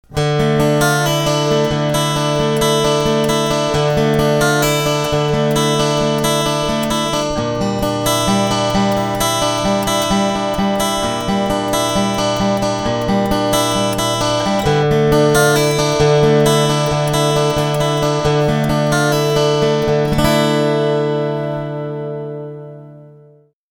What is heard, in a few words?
minor picking